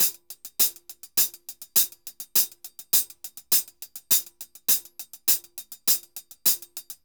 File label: HH, Festa Latina, Drums & Percussion; Best Service; Festa Latina VOL-1